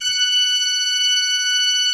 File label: F5, Cathedral Organ; ILIO; Propeller Islands